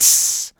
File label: Human Beatbox, Beatbox Hits